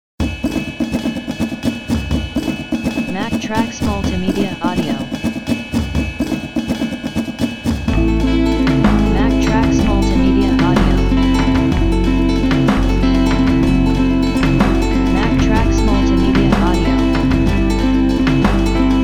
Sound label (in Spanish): guitarra flamenca